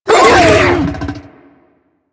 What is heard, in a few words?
Infested Juggernaut Vocal Pain; Warframe